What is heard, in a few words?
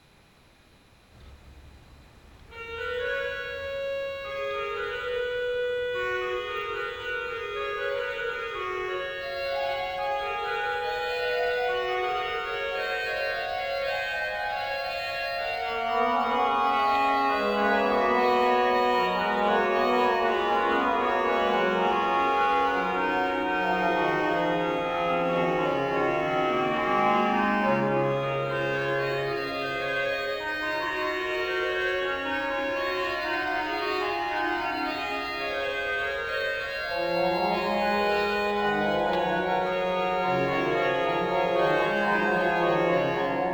dandrieu trio